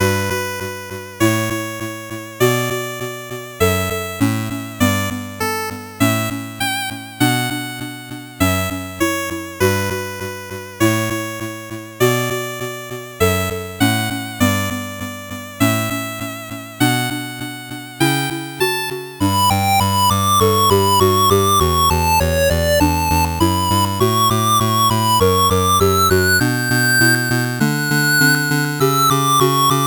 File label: 8bit bgm normal